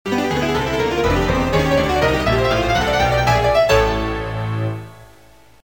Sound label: Fast up